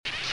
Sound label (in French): Bisous